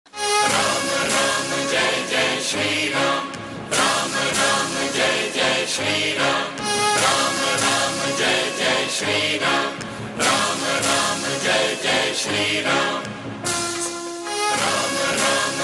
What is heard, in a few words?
Background Music